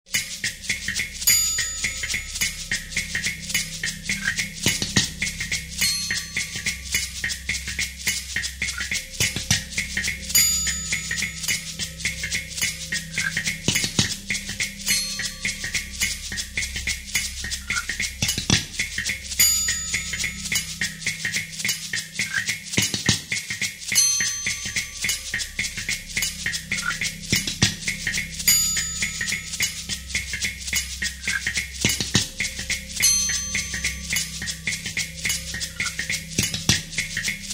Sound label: Show Beat